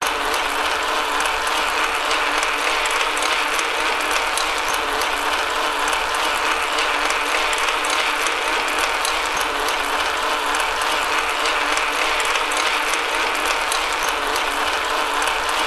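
transport bicycle pedalling road fast onboard pov looped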